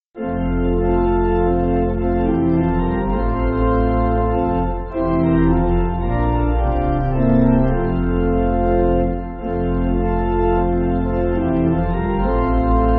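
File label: Pipe, Pipe Organ, Backing Files for Churches; I Lay My Sins; Prysgol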